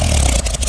stop, cessna; gmod; lfs luna s flight school

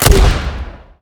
fire, 40mm; gmod; arccw uc commons attachments

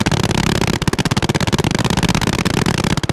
mg light loop; planes; gmod; lvs planes; weapons

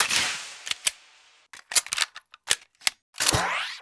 reload; republic lg

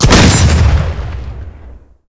gl fire buck; gmod; arccw uc commons attachments

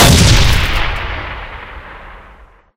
impact, turret; gmod; lvs star wars

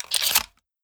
shotgun insert; gmod; arccw uc commons attachments

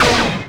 shoot; at-rt

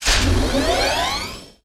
standup; gmod; kingpommes; starwars; atrt

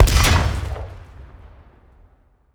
armor pen; gmod; lvs framework

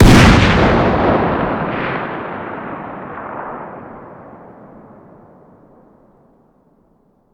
fire dist 50bmg rif ext

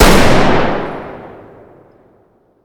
fire dist int shotgun

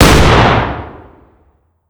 fire dist 12ga int; gmod; arccw uc commons attachments

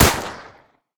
fire; gmod; arccw uc commons attachments

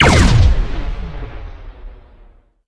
twin cannon laser; gmod; lfs tx 130

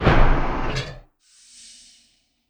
rocketpods lower; gmod; lfs tx 130

